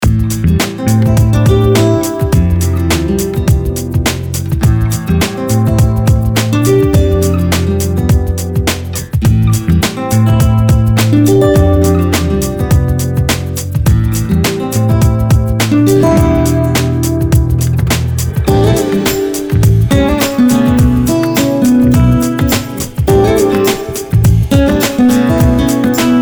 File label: full mix